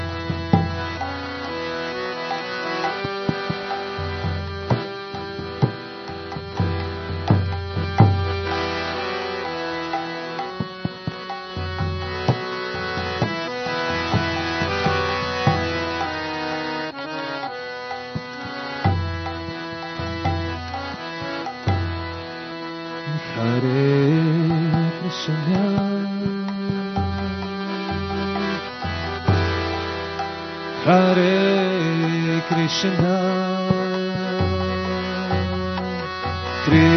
Bhajans Kirtan Hare Krishna Kirtan

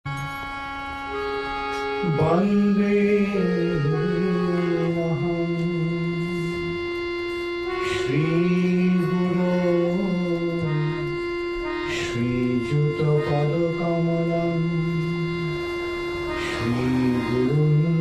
Mangalacarana Sung; HH Bhakti Caru Swami; Pranam Mantras

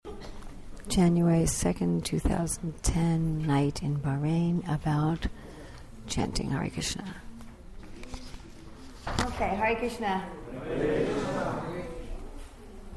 Chanting Hare Krishna; ISKCON Matajis; Bahrain

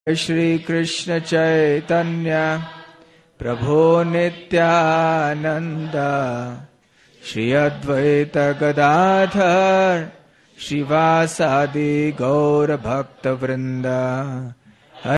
Panca-tattva Maha-mantra Sung; Pranam Mantras

